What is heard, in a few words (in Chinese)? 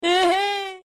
嘿嘿; 蜡笔小新